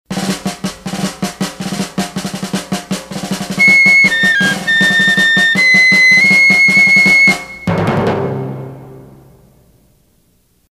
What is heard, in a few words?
Fife and Drums; PAMS Numbered Series; WCOL